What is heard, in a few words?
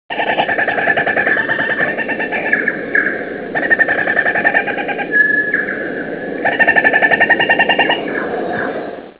kakariki